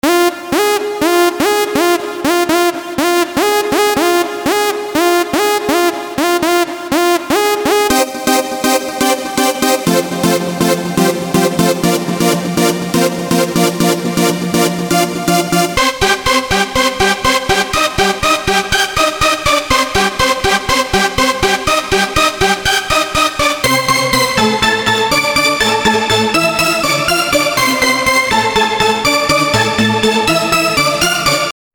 electro house keys

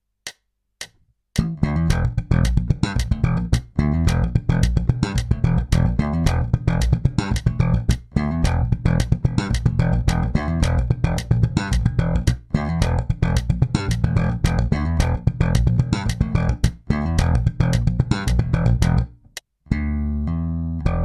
Slap bass

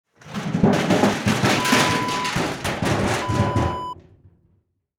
garbage can roll

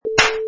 Face Slap

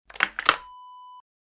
shotgun action open

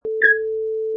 Chirp

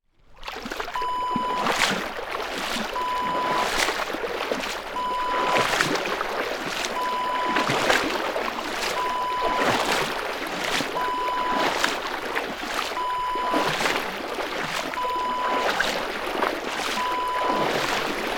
footsteps in water

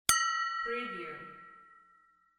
glass clink

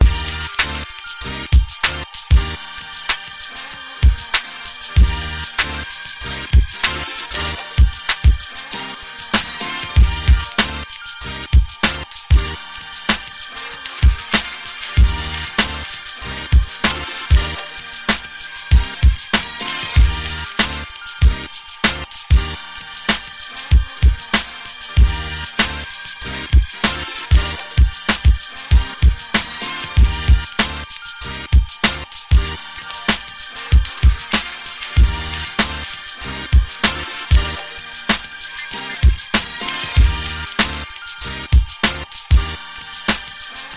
beat

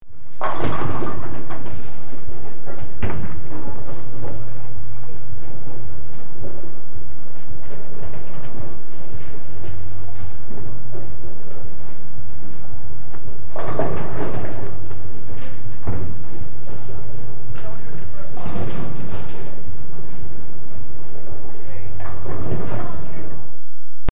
Bowling Alley

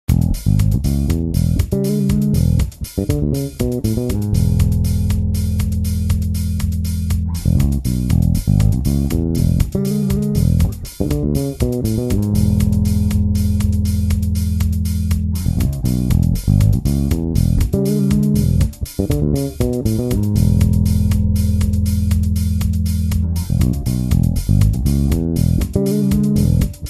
E7 blues lick with doublestops

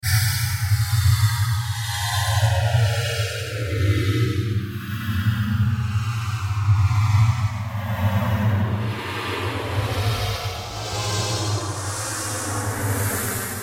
Sci-Fi SFX

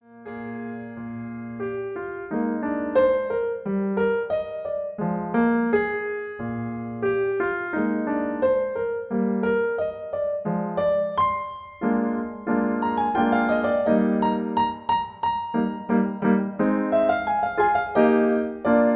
Accompaniment E flat; Benedictus

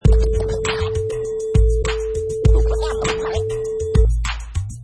Scratch

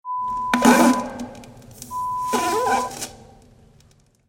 Fart sound

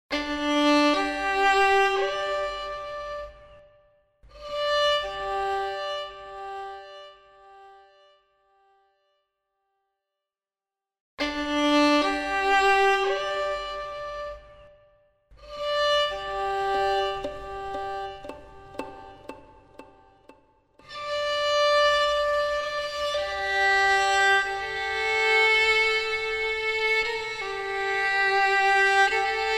Solo Violin

